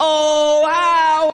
ooh ow, Voice; ooh ahh

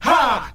"ha", Voice

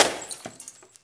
glass shatter

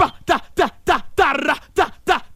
dah dah dah dah drrrr-rah dah dah, Voice

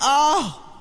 "ah" orgasm; ooh ahh

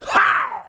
how; ooh ahh

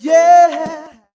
"yeah" sung

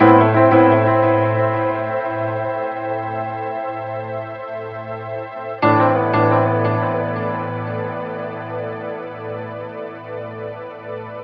piano and keys, sad loops

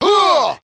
"huh", voice; ooh ahh

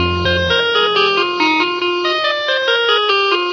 messed up and harpsi, Loops Electronic

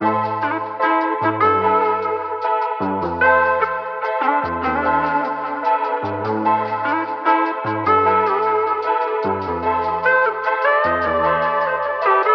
keys, Loops, Sad

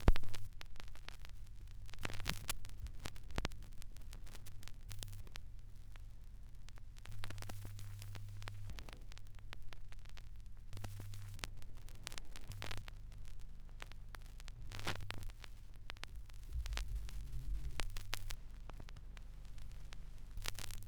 crackles, Vinyl

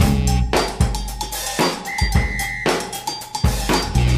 throw the whistle up, drum loops, funky

